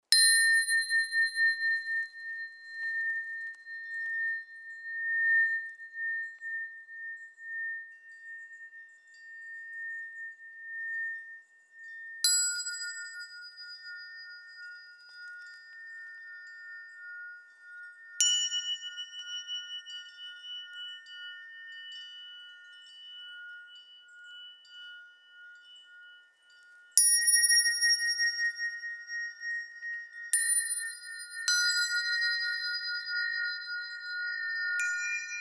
Marti Forks

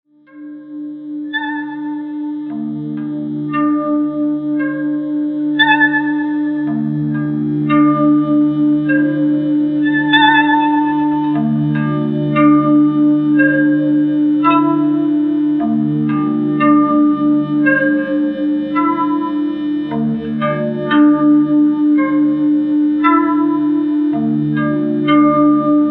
Nice Noise Heavy Weights

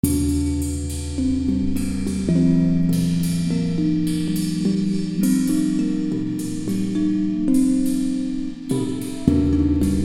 Those Other Gongs

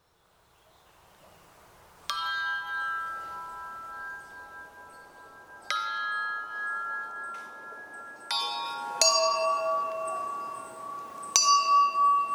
Spin Chimess

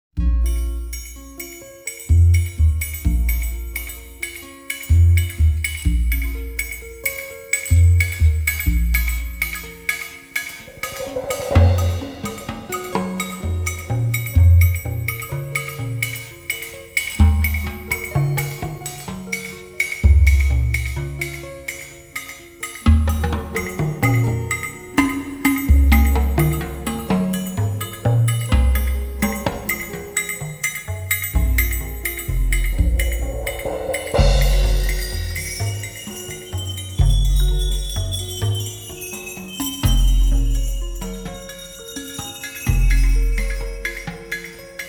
Balloon Drums

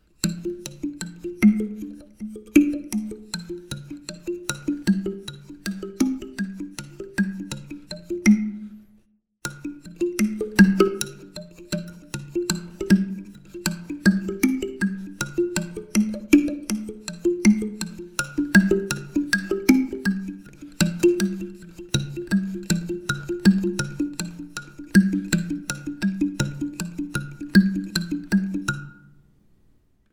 bamboo idio

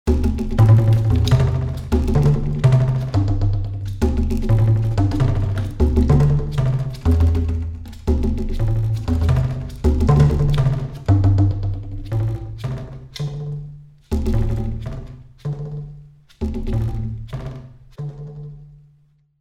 Play Hear Side Struck

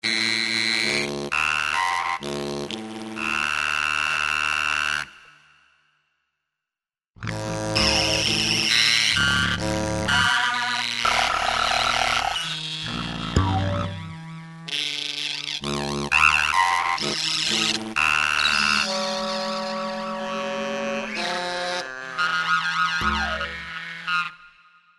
Trombone Bell

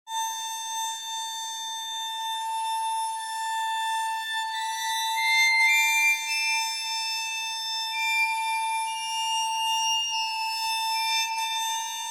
bowed T rods

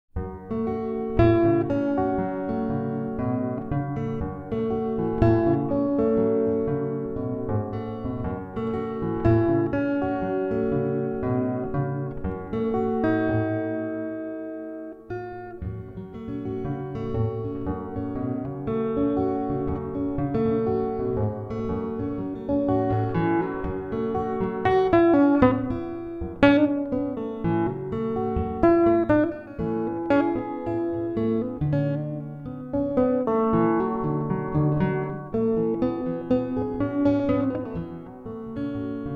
floating bridge guit

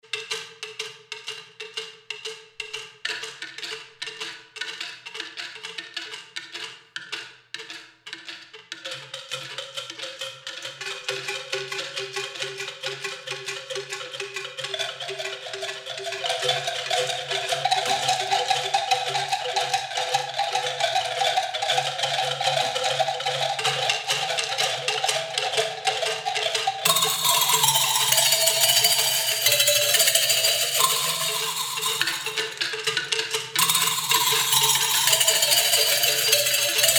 dino shaker arms

